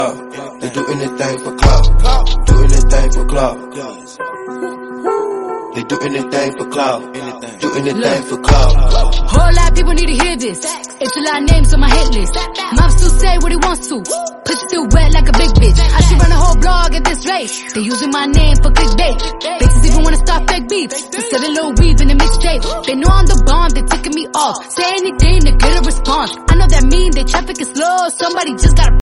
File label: hip hop english song tone ringtone